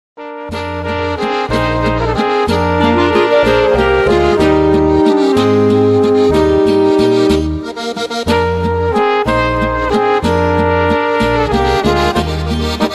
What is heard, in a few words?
Instrumental